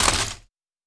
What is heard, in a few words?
Glass